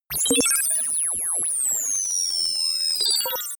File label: interface calculate